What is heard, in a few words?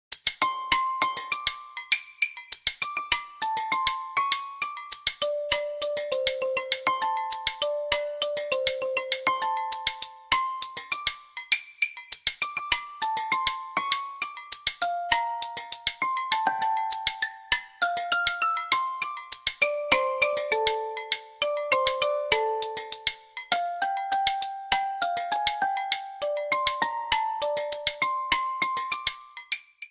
ringtone